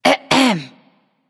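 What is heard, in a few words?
clear throat, female; smite; voicepack; hera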